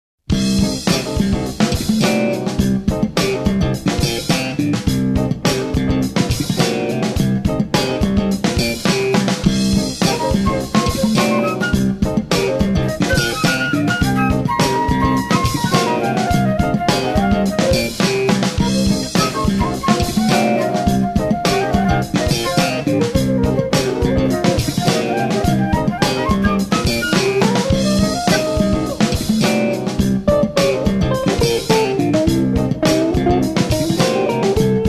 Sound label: FUNKY 70